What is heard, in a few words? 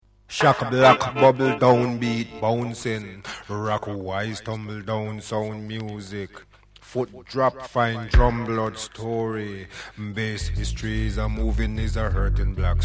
Reggae Sounds; Island